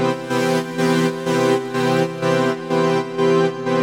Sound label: Pad DS201 125 C, sidechained